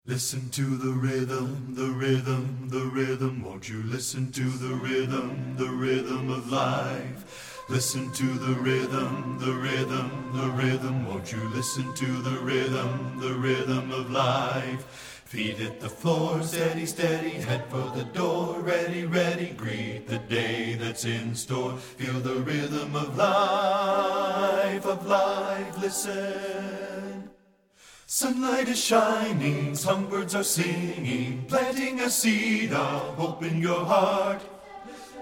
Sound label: BASS